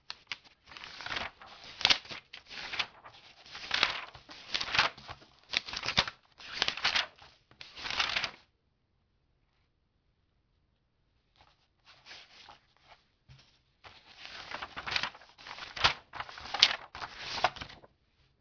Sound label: Magazine pages